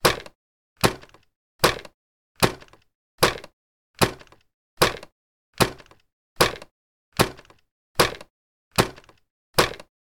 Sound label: science fiction robot walk slow; sound effects one